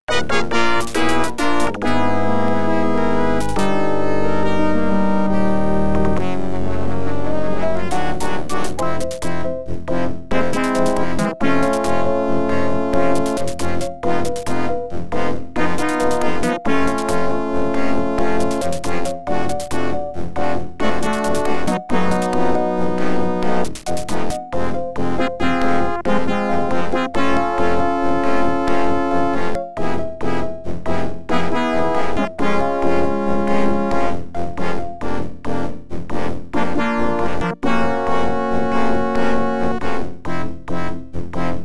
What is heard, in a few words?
OPL2; X WING